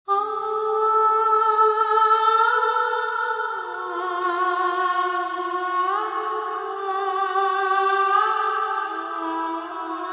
Alaap; yaadein 2001

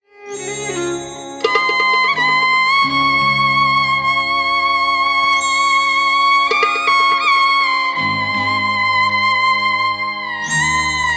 Theme Sad; Kamla

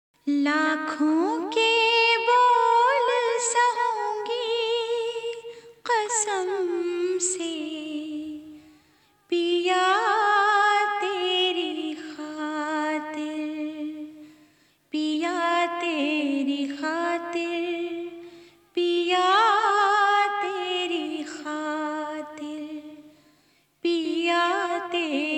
Sad Version; ghunghat 1988

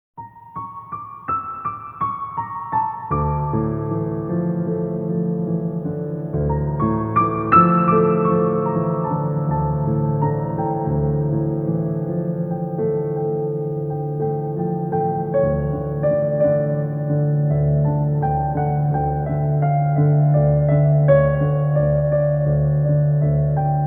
Piano Theme; 1947 earth 1998

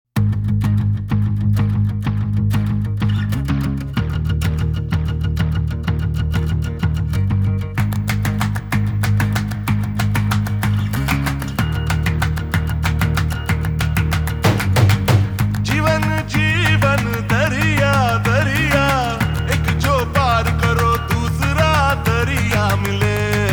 gully boy 2019

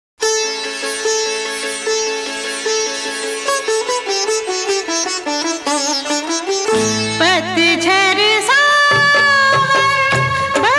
Female Version; sindoor 1987

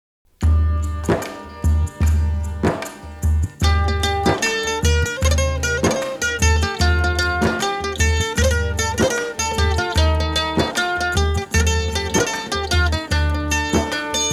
Sad Version; satyamev jayate 1987

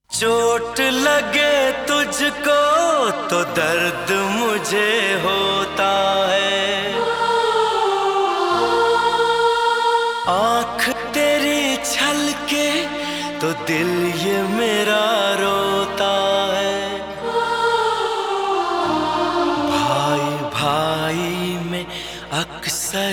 Sad Version; raja 1995

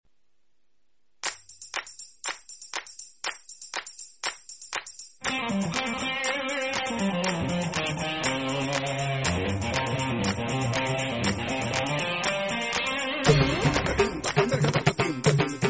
Fusion Music; Nayee Padosan

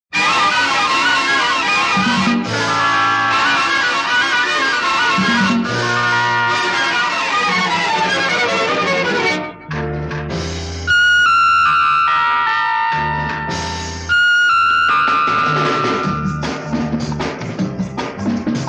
Title Music; Bhookh

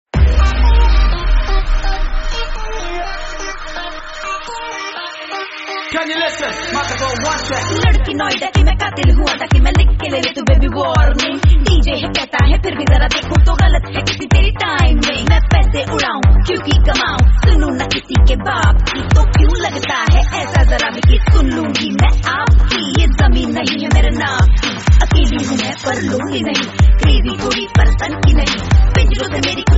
Rap Battle Female; Dolly Kitty Aur Woh Chamakte Sitare (2020)

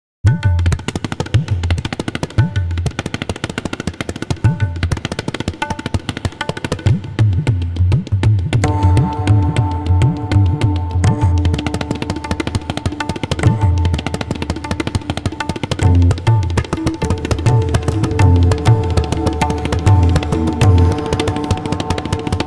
Instrumental; beyond the clouds 2018

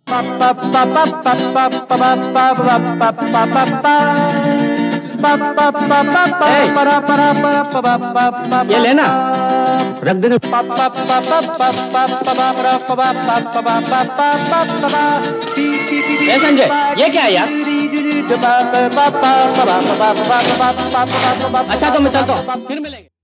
Theme Instrumental; Hum Kisise Kum Naheen

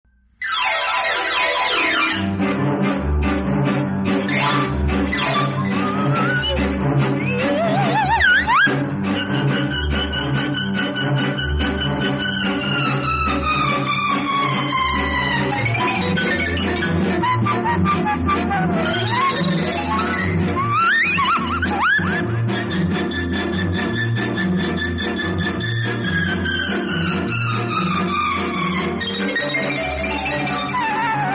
Instrumental; ram aur shyam 1967

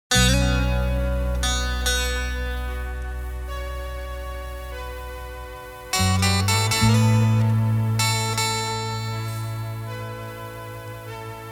Sad Version; satyamev jayate 1987

